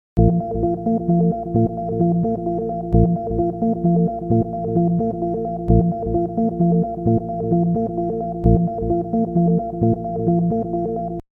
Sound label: sine snippet